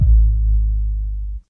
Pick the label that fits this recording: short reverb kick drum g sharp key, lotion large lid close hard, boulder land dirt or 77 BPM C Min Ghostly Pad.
short reverb kick drum g sharp key